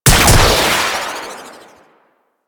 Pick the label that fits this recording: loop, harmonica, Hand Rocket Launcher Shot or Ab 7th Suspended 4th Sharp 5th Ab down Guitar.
Hand Rocket Launcher Shot